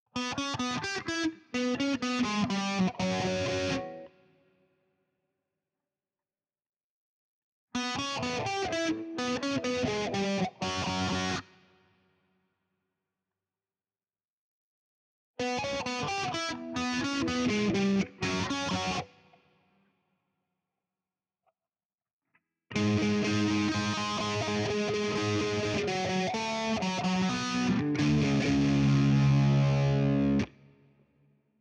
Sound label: Guitar